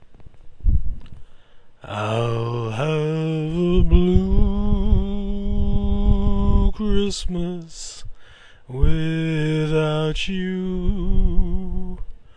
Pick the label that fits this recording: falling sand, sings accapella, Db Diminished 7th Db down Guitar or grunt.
sings accapella